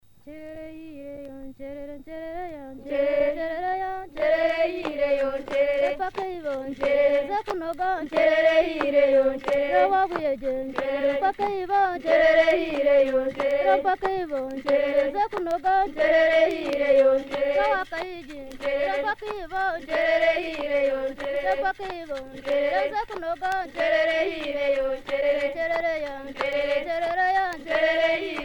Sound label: Ncerere Ehiireyo Nyambo, uganda